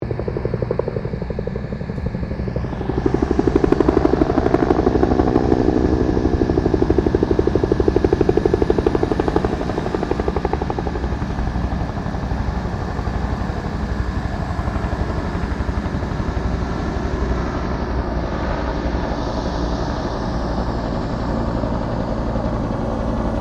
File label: HELICOPTER 1967 BELL UH1 IROQUIOS PASS; RAN UH-1 Iroguios; Helicopters (Sound Effects:Vehicles)